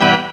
DR. LOOP; X-Static Goldmine 3; CHORDS

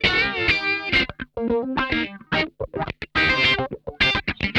RAW, Funk Guitar; 90 s Sample CDs; Zero G

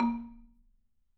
MMEDD A#, Marimba; MRMBA CMB 1A; Sampleheads; Dave Samuels Marimba & Vibes

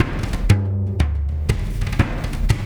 Tactile Impulse, Processed Drumkits; Sonic Foundry; Zero Gravity Beats

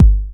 909 KICK, Elec. Percussion; E-MU Producer Series Vol. 2; More Studio Essentials; Composer

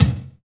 BD, Drum & Bass, 160 BPM

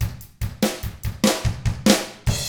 rock 6/8, drums; ILIO; Double Platinum Drums 1

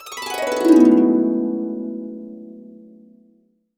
E MAJ, Harps; USB Soundscan vol.62; AKAI

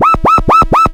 alarm; Ueberschall; Techno Trance Essentials

